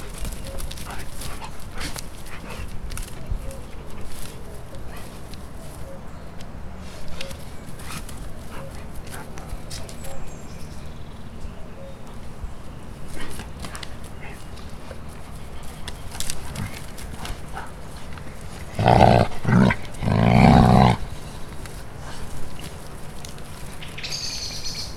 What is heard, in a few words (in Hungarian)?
zsákmányért harcolnak morognak, szürke farkas; szegedi zoo 2011